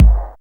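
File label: bass drum, Rave Kick Snare, Percussion; Dance Synths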